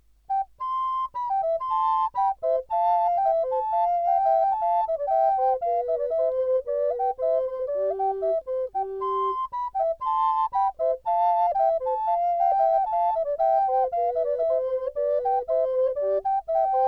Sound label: canon, flute a bec; telemann